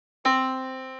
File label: yamaha Disk